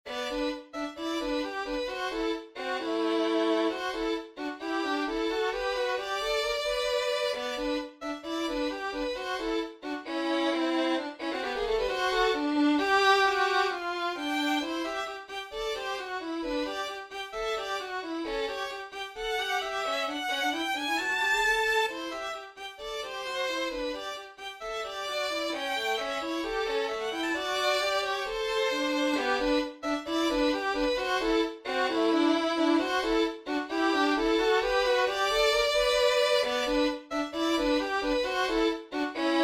Duo 2 Violinen; Besetzung: Violin Duo; Schwierigkeitsgrad: 2 - Einfach; Tonart: G-Dur

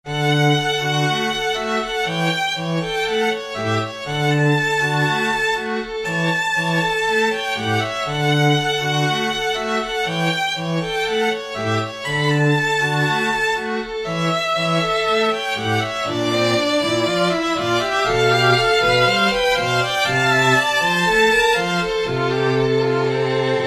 Tango one Streichtrio

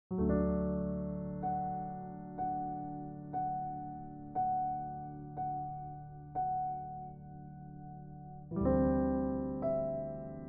Hineinhoeren Klang und Melodie; Besetzung: Klavier

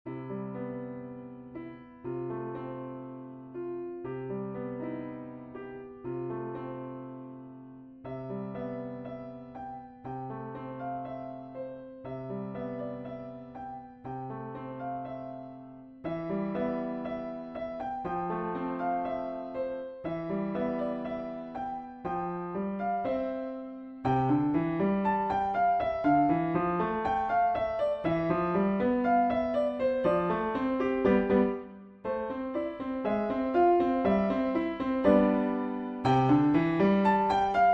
Klavier 2 Floeten Klavier; Hoert ihr wie die Engel singen

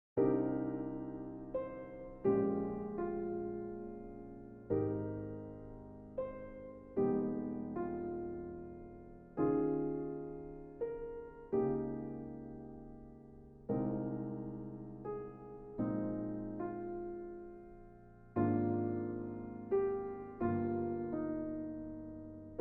Empfindungen Bedaechtig und sanft

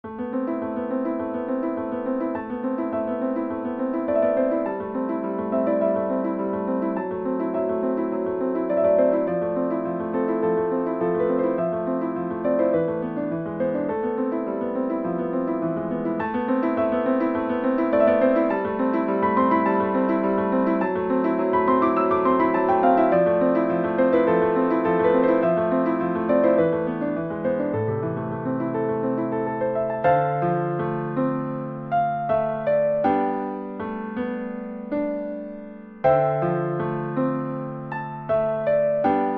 Etuede IV

